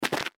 Wall Jump; Gameplay

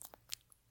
lip sound; SEXUAL sounds; ROBLOX GAME ASSETS

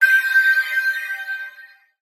GUI Select

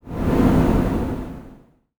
GUI Swipe

